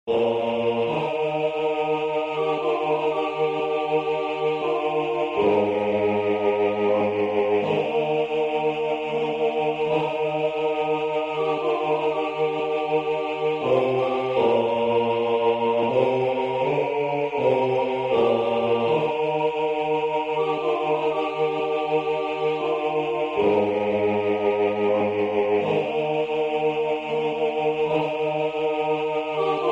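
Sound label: amazing grace coro bass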